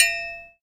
Bells

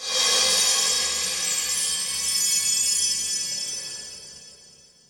space chimes, Cymbals Electro

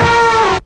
elephant funk

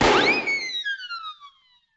whistle up cartoon

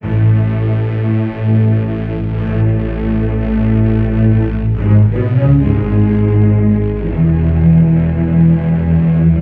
keys and strings, Sad; Loops

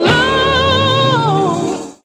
"oh" sung

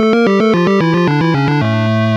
buzzsawing, Electronic Loops

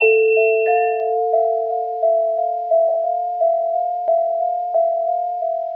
winter beat, Loops Electronic

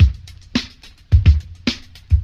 Drum loops, Boombap

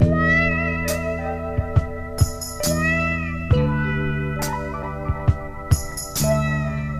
guitar, Loops

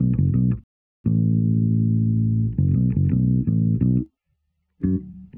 bass, Loops

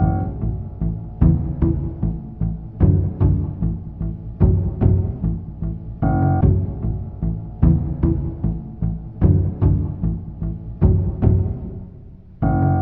strings sudden piano; Loops; Sad

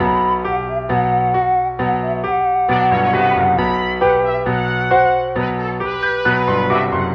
horns and piano, Sad

